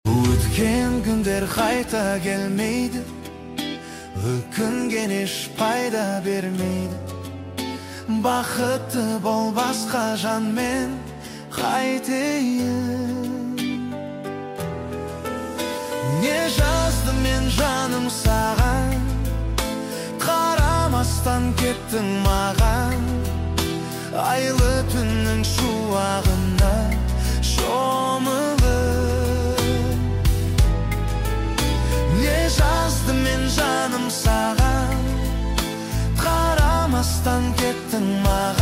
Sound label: karaoke